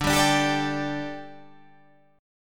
D Suspended 4th D

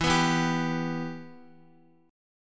E Minor E down Guitar; strummed

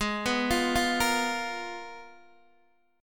Ab Minor 6th Add 9th, Ab, Guitar, Open D

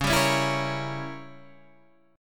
G Diminished 7th; 9,10,11,9,11,9

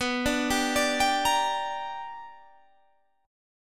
B Minor Major 7th Sharp 5th Guitar Standard; arpeggiated; JGuitar

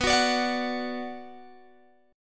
B Minor B Guitar Open G

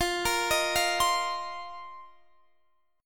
F 7th Suspended 4th, Guitar DADGAD

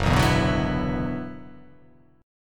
F sharp 7th Suspended 4th Sharp 5th F sharp down

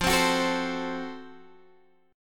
Gb Minor Major 7th Flat 5th, chord

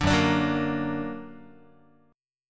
Gb Augmented Gb Guitar; strummed; 4A,4E,5A,5D,5G#,6C#

